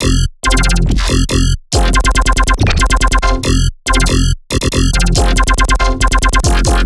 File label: Melodic Bass Loop F 140; Loops; Ghosthack - Color Bass Essentials